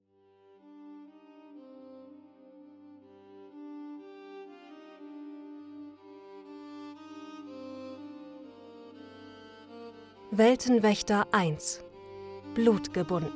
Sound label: Background music